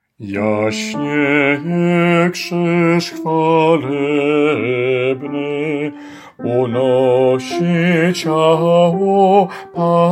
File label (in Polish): Jasnieje krzyz ALT